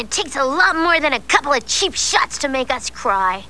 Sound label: pain; quake2; buttercup